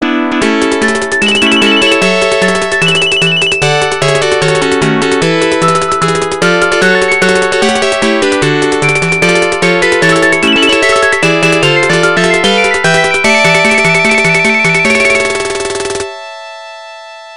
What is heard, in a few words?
All Clear; commando arcade